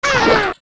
Die; Lizalfos; zelda; OOT

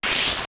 Net; Swinging the Bug-Catching Net; Zelda

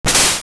Underwater Fence; Zelda; MC

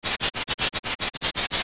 Shock; Link getting electrified

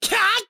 Attack

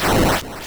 Turtle Rock Shed Full

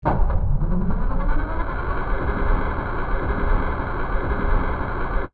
Elevator Seal; Forest Temple

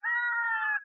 Night Bird

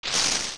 Deku Scrub Up; zelda; OOT

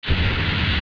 Dodongo Flame; zelda; OOT

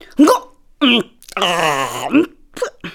Gulp Fish; Oren grabbing and swallowing a fish; zelda

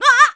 Ledge Fall; Link falling and grabbing a ledge; Zelda

